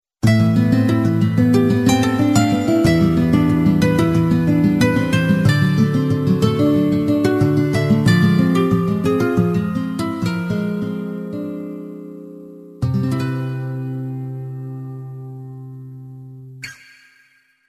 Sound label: flute ringtone; android